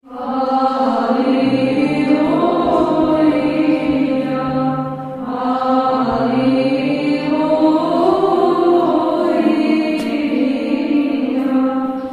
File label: hallelujah church choir